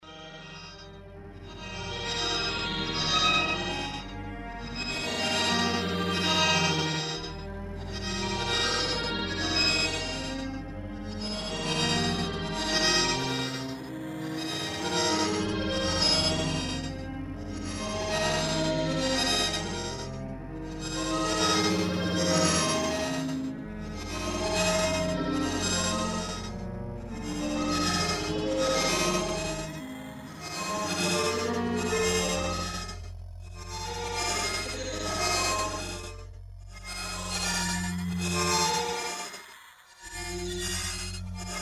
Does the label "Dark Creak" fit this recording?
No